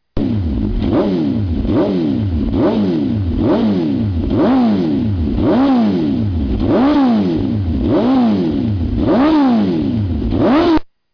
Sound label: Hamaguchi Exhaust GSXR1000